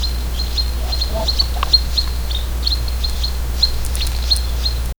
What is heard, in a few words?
gentle jungle ambiance, bird calls